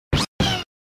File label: yoshi sound; super mario world